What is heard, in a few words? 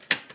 insert, Floppy +3; Mass storage; plus3 disk; zxsp